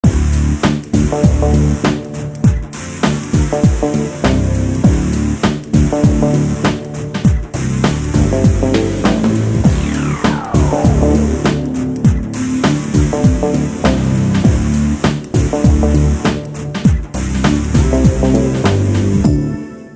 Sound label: techno